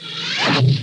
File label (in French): cracheur; ArcEnCielEtLeLagonMerveilleux; FrenchVersion